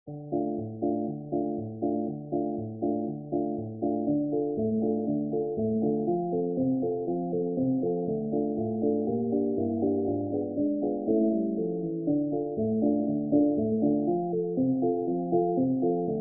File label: klezmer